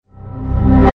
windows xp error reverse

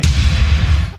boom

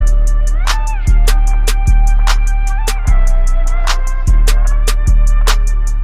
instrumental

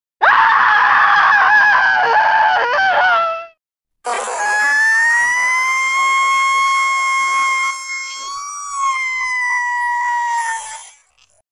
female scream

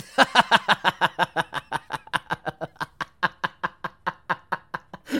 laughing

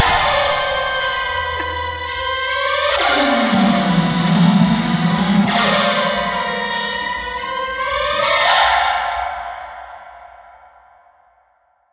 satanic organ

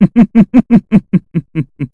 laughs; mizuchi

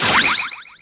ahooga; super ahooga bros

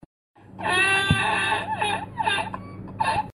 spanish guy laugh

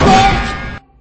bonk sound

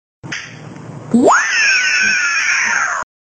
uaaaahhhhh

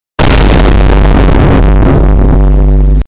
loud boom; shit post

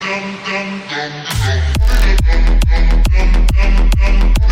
bass boosted

